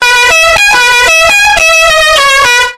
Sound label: Car Trumpet Jingle; gamerip; (LOUD); Raving Rabbids: Travel in Time; Wii